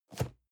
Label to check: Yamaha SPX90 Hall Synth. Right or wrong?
Wrong